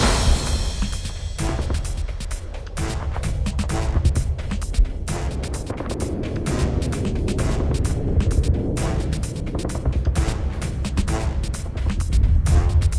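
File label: free spins Splash Ambience; wild worlds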